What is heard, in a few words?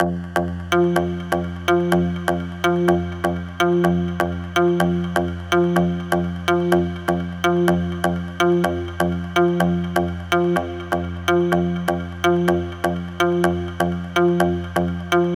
F 125 Synth Loop